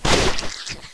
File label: claw miss